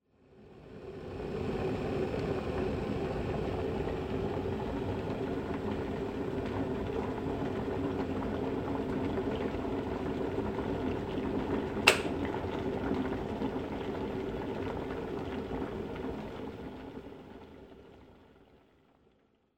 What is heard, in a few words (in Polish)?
czajnik elektryczny; karty obrazkowe